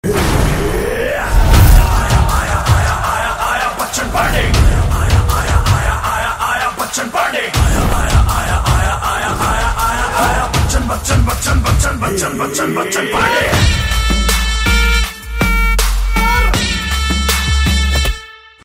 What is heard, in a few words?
hip hop bollywood song tone ringtone